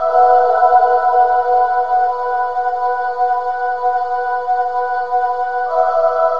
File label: PAD; GrooveMaker demo; Serious Software; Macworld 1999-04